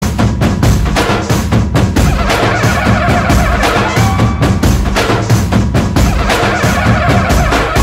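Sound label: background music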